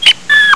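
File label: squeak, rat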